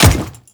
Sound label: Default Step, Footsteps; Warframe; Grineer Royal Guard